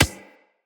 Beach Ball Bounce Dirt; Water Fight; Beach Ball Floof

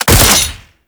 Ghoul Sawman Shot; Warframe; Grineer Ghouls

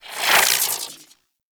Overcharged Gyro Move; Warframe; Warframes; Gyre

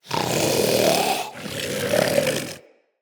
Vocal Breathe Slow; Characters; Hunting Critters; Vulpaphyla

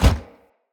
Heavy Grunt Boot Walk Gravel, Footsteps; Enemy; Sinecure

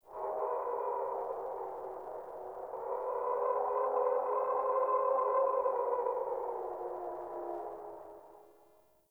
Wind Gust Howling Low, Venus Landscape; Warframe; Venus Open World; Ambience

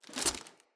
Battle Dog Foley Land; Snc Battle Dog

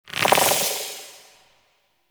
Sentient Threat Bubble; Railjack Hunt

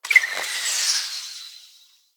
Bow Arrow Projectile Start; Sinecure

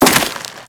Crewman Step Dirt, footsteps; Warframe; Corpus

